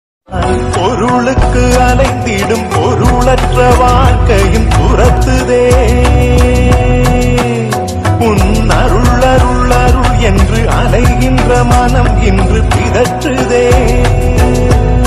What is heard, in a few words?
tamil ringtone lord shiva ringtone devotional ringtone south indian ringtone